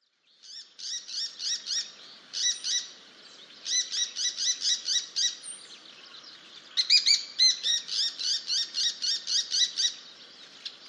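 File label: bull-headed shrike, watarase; mozu; koe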